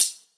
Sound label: hh closed, 808 mini